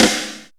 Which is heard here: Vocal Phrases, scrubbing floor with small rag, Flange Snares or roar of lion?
Flange Snares